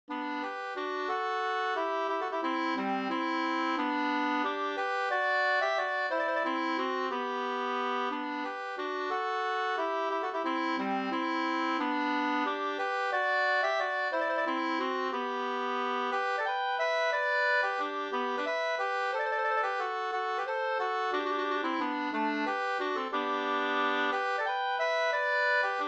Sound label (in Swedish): vals, G dur